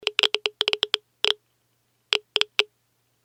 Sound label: Geiger Counter Low